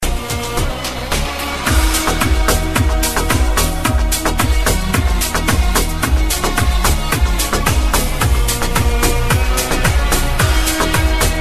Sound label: iranian music